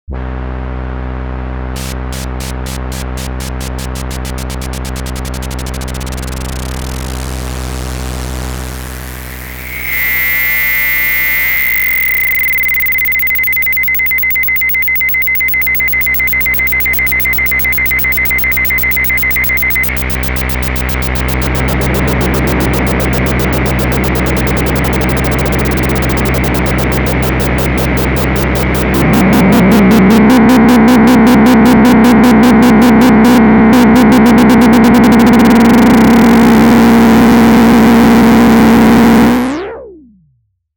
LFO Square ADSR Out ADSR Trigger. Wellenformen Saw Square und Noise Resonanz Drive Feedback

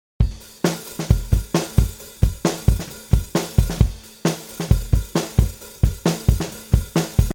Kit roh

